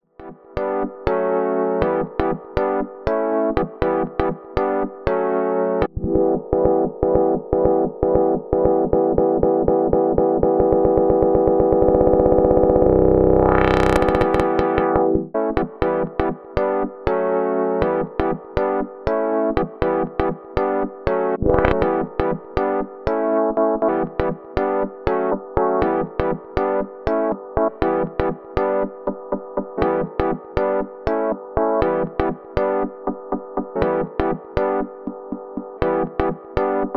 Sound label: Beat Div Cutoff; AKAI MPC LIVE II